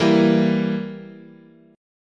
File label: E Diminished, chord